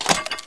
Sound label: svdex foley, weapons